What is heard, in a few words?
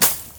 plant hit; everness